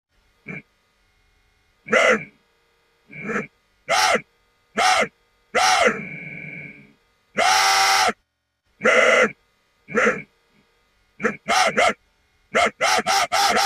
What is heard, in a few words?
ruah ruaaaaah